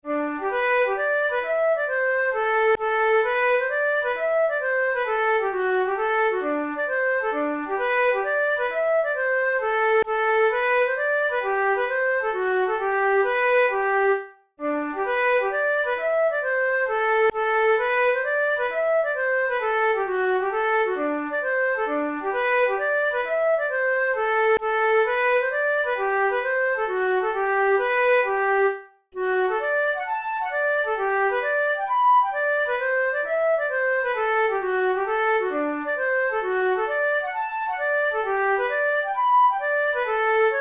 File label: hornpipe; Miss Clark's hornpipe